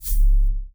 spin Button Click; PIXIGSLOT; netent

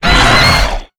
Grunt Hit Heavy; netent

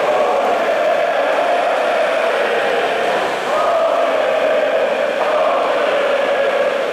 crowd loop Away; netent

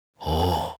red Panda Looks Up; netent

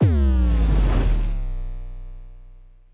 Start Hit fruit blaze; netent

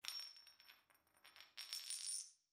beat coins; netent

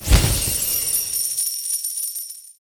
coin Win

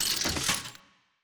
reel nudge; netent

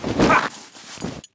freespin Police Reaction

